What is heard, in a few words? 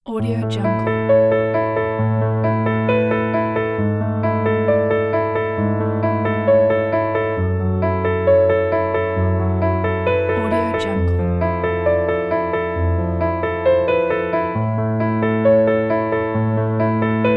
nostalgic piano; sebastienmarchand